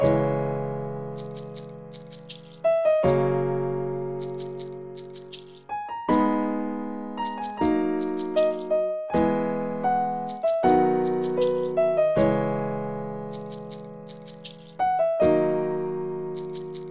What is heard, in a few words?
Forest village sad Loop